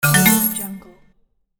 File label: slot machine win; audioheart